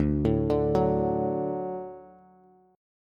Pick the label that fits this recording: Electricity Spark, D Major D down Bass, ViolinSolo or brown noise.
D Major D down Bass